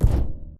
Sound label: Footstep Jog Far; Titanfall 2